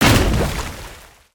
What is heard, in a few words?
Oil Spray; weapons; aronwk collection; ndaudio